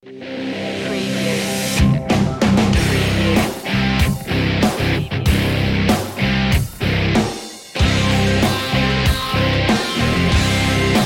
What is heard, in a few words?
attack of the guitars sting